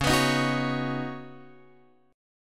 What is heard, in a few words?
Db 7th Suspended 2nd Suspended 4th, Guitar, Open F; x,8,11,10,9,8; Db7sus2sus4 strummed